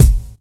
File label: raw bass drum one shot a sharp key; kick drum samples